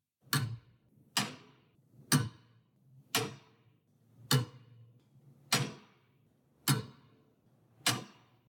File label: clock tick loop; teardown; ps5 windows xbox series xs